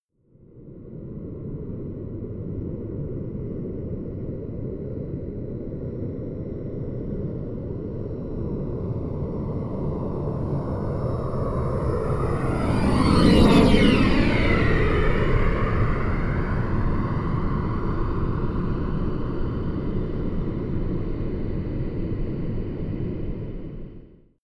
plane honda private small flyby left to right close